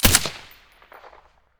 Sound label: scar silencer stereo